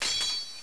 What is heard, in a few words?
Sabla; Cossacks